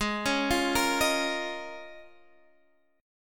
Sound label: A sharp Minor 11th G sharp, Guitar chord